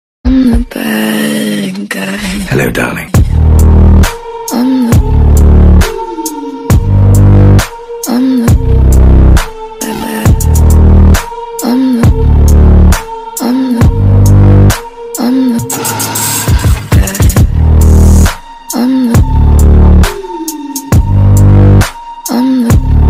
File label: remix ringtone